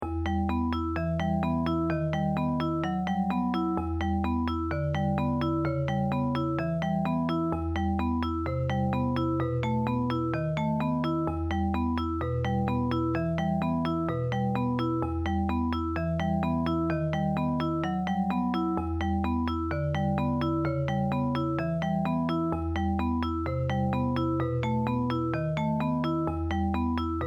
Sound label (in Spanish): estudios basicos arpegio